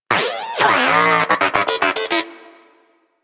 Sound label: Moa Inquisitive Vocal Enemy Killed; Warframe